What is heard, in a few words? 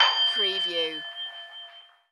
Bell Hotel Desk Antique